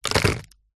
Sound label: food biscuit broken crumbs drop into plastic wrapper tray; zapsplat